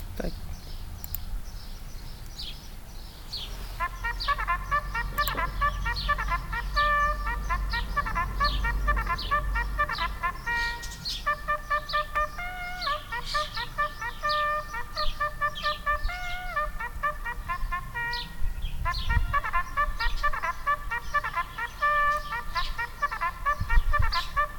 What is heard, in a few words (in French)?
sonnerie clairon reveil